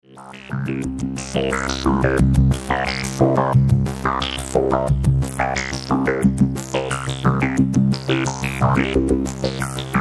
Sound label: xpander fx2; xpantastic